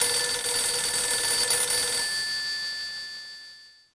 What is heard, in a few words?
Phone Ringing Old; Max Payne